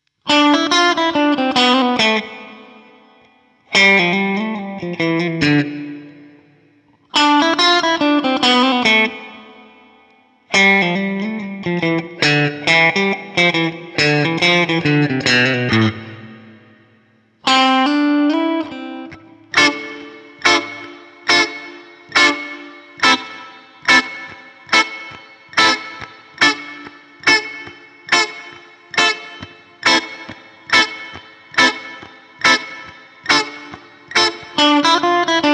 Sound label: Guitar